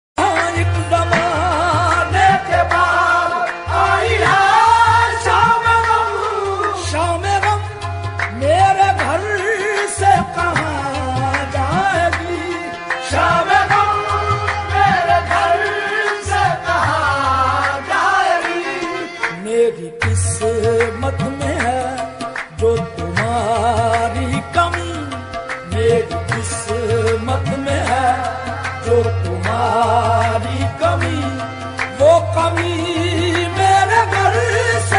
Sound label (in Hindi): sad hindi